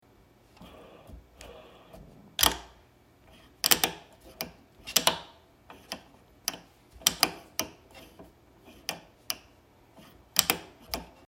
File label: Editor Keyboard sounds shuttle; Resolve